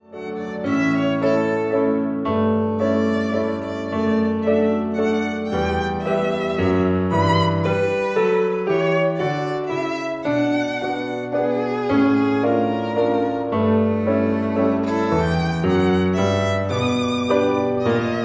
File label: VIOLIN